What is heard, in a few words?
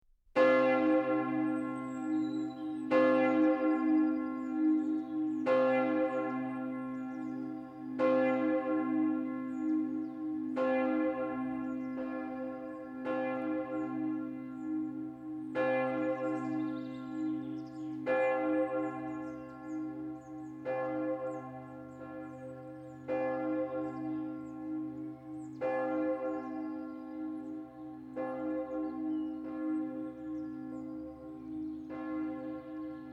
bells